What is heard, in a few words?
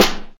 hot snare sound g sharp key; snare drum